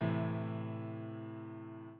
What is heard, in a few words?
a minor chord